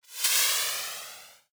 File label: takes off, message tones